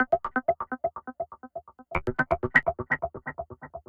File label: Analog Synth, Arpeggios; Electronic Point Blank